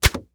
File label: Weapon Crossbow Fire Arrow Release; AdobeCC